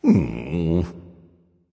sigh; smite; Vulcan

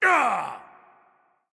hitreact human male large

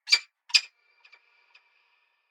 ambience bad birds; env s8conquest; smite

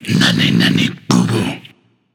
tongue; smite; olorun

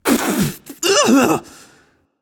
clear throat; smite; voicepack; janus

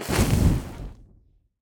hit; Smite; god Khepri

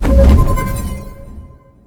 swing around; smite; god atlas

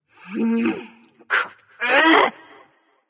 grumble light; smite; voicepack; hel

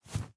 footstep; smite; cabrakan

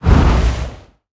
roll intense; smite; god geb skin7

